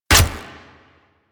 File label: Shot, Weapons; Warframe; Infested; Inf Cernos